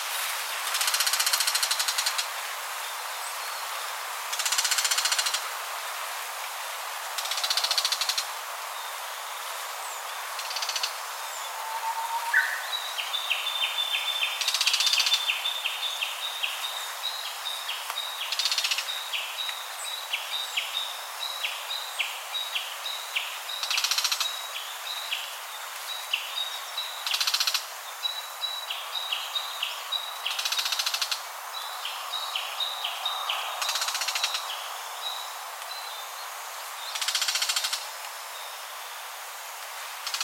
Lanius bucephalus Cettia diphone

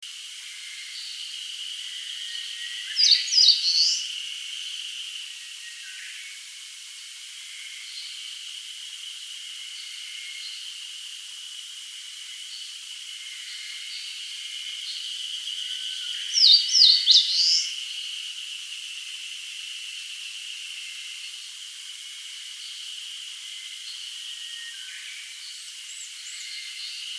Phylloscopus coronatus

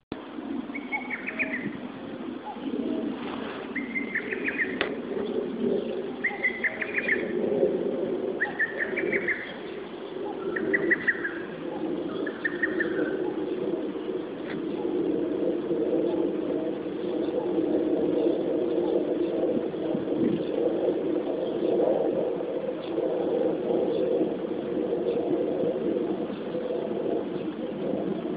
Cuculus poliocephalus Cuculus canorus

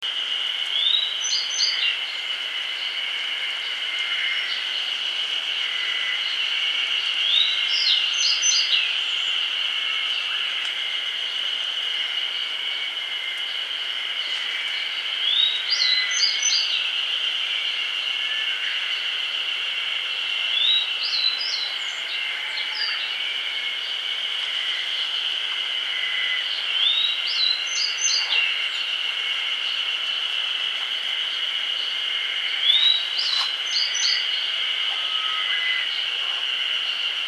Emberiza variabilis

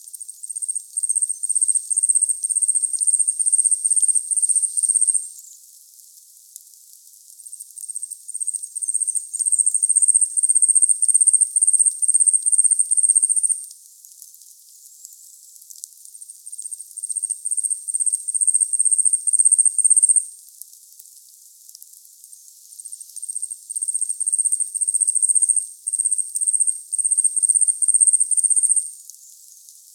Urosphena squameiceps

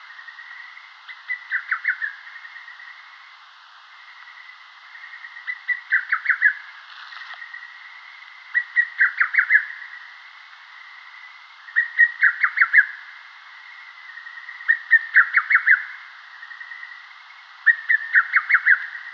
Cuculus poliocephalus

